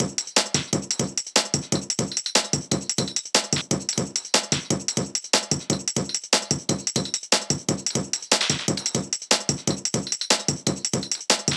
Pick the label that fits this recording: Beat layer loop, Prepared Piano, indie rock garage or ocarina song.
Beat layer loop